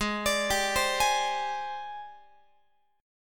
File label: Ab Major Sharp 11th Ab down Guitar; AbM#11 arpeggiated; x,16,17,17,16,19